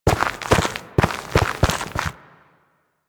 Steps B, Forest Night; Warframe; Sacrifice; Ballas

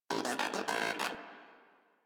Moa Aggressive Vocal Studious; Warframe

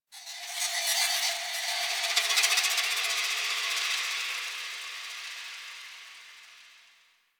High Texture, Ambience; Warframe; Quests; TheNewWar; Zariman

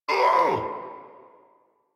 pain; Warframe; Tyl Regor Boss; Efforts

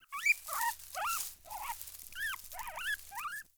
Hare Idle Chatter; Encounters; Animal Rescue

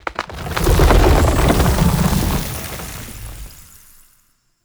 Root Growth Bridge; Puzzles; Bean Stalk

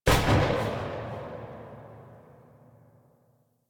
Spark Rifle Tail; Grineer

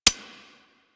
Pistol Mech, Weapons; Harlequin

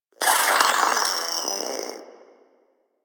Proto Infested Maggot Awake, Vocals; Warframe

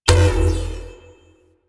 Sphere Small Power On; Warframe; Ambience; Entrati

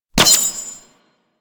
Glass Shotgun Projectile Hit; Warframe; Tenno; Weapons

